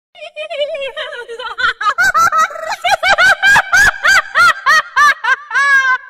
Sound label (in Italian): risata terrificante degli elfi, laugh scary creepy elf; effetto sonoro